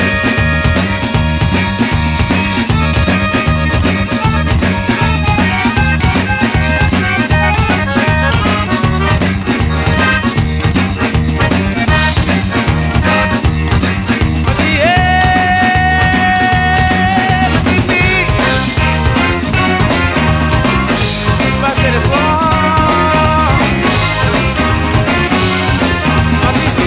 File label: blues